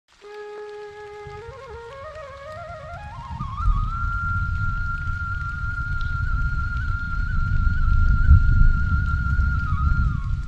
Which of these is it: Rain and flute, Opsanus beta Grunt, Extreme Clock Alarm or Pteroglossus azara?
Rain and flute